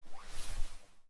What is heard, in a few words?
Grenade Throw; zps; Weapons; Explosives